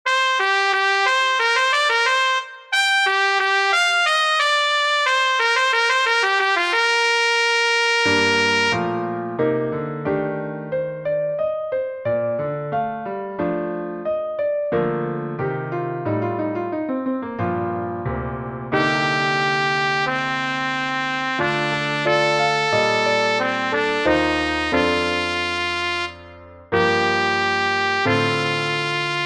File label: trumpet and piano; three preludes